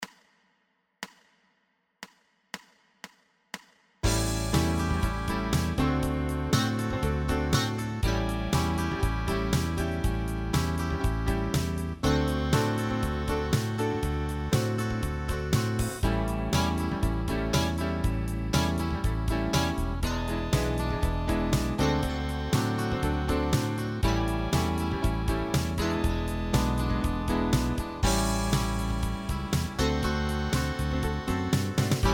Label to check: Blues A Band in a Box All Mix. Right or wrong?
Right